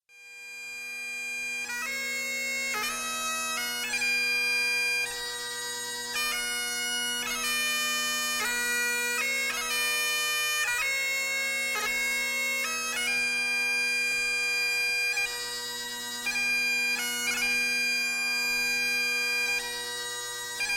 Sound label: Slow Air and Reels